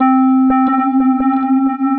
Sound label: Ambient & Evolving; Ableton; Glitch and Wash